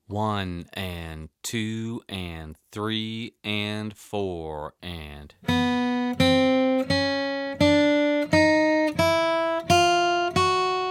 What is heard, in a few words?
Chromatic Scale